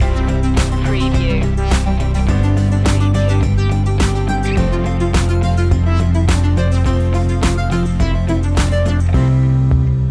motivational song loop

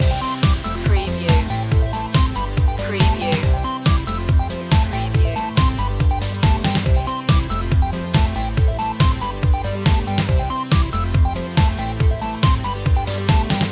singing robots loop

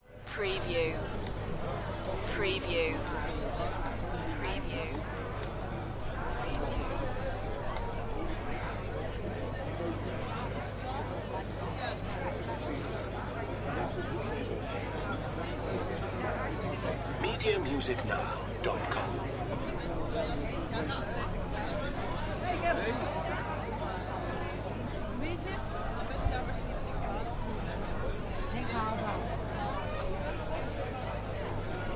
Outdoor Pub Ambience

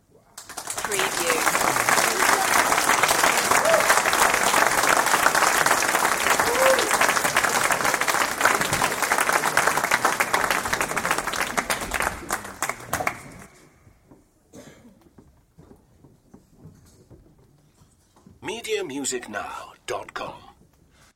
Audience Applause; beanstalk audio

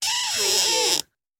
squeaky hinge

